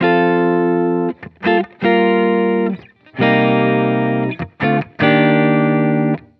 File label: Electric Chord Loop 150 BPM G Min; Cymatics